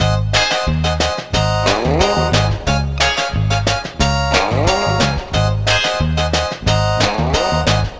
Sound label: Lounge Full Mix-G#; Zero-G Kocktail Kollection; Construction Kitz (Lounge); Welk Lounge 090